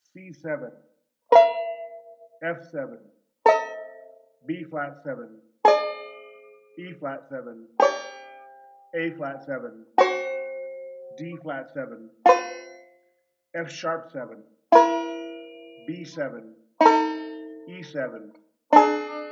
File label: Descending Tritones, banjo; Beyond Chord Melody Volume II